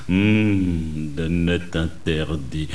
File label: mmmmmm